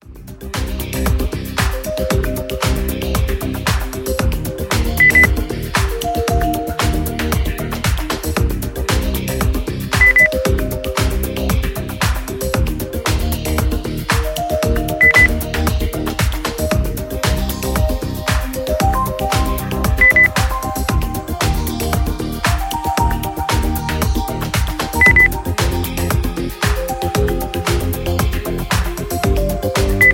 percussion trancefloor beep

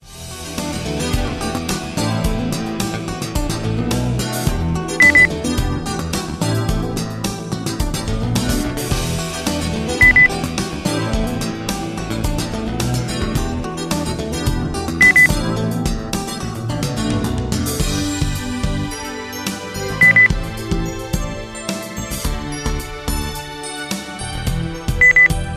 rock turning wheel beep

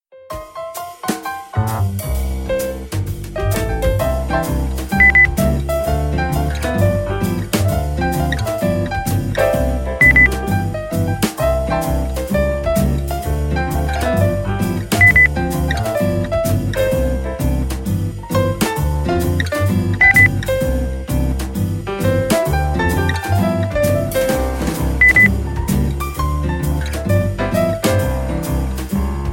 jazz

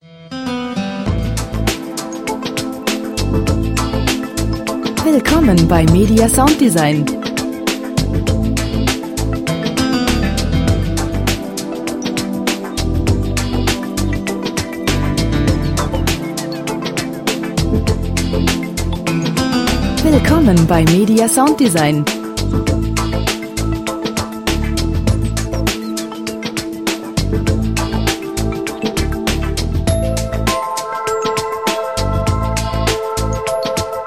lounge smoothie

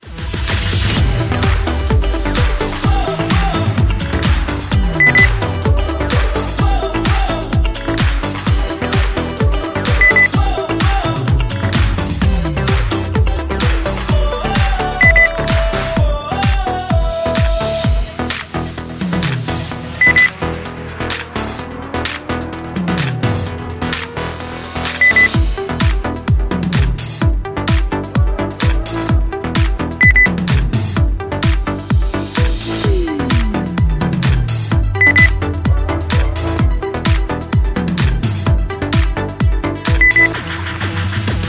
modern vibrations

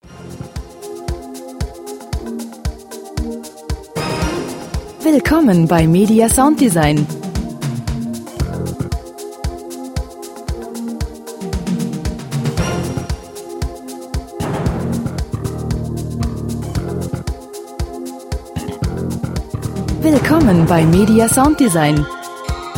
percussion

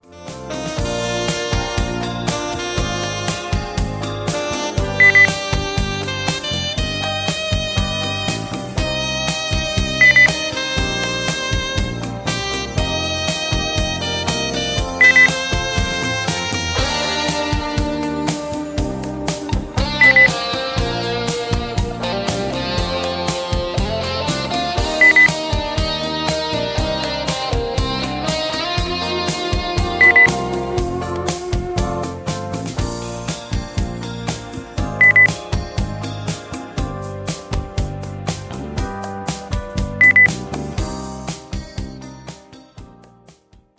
jazz

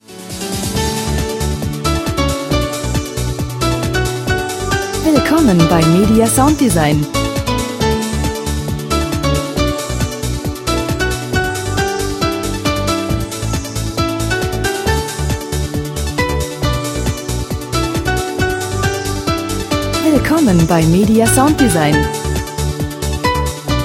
pop

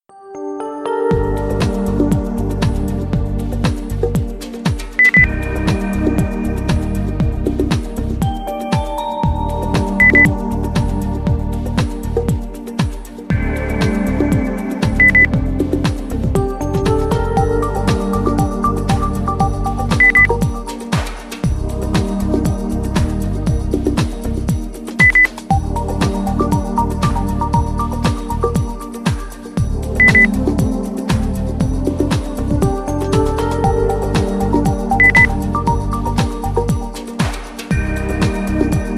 lounge

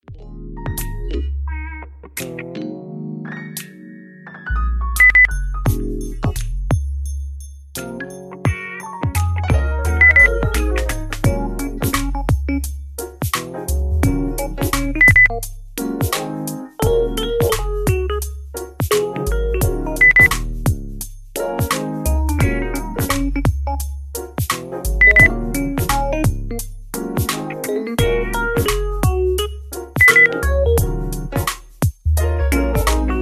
groovy beep

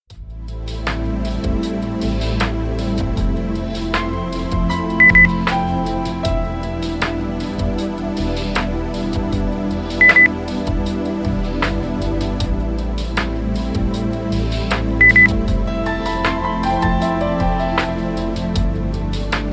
lounge

